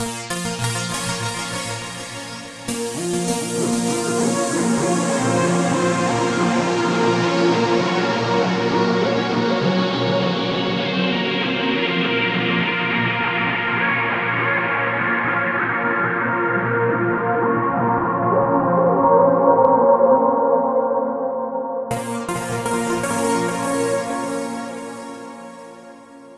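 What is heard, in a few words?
legato with portamento; zynaddsubfx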